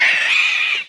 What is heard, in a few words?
Metroid Vox